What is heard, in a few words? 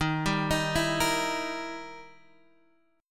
E Minor Major 7th Flat 5th, D sharp